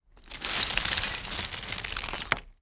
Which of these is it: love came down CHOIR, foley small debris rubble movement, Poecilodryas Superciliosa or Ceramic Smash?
foley small debris rubble movement